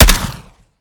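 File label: weap suppressed; weapons; gmod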